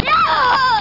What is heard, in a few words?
F SCREAM